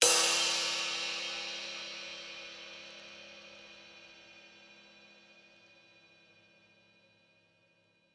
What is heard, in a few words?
crash; eddyflux; dough-samples